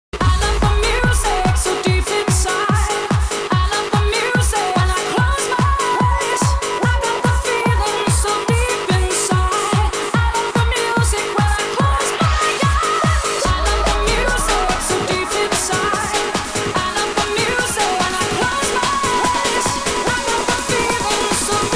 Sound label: Music Is Pumping